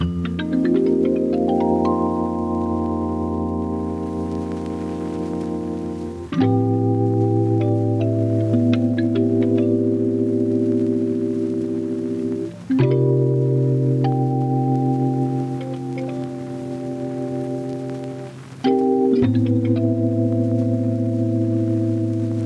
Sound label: Dusty Rhodes Neo Soul Loop 75bpm